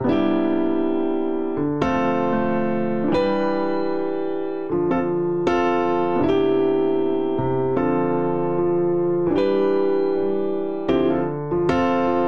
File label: Progression Block Chords Rhythmic I - ii - iii - ii C Major 77 bpm, Grand Piano; MIDI Clips; Ableton; Factory Packs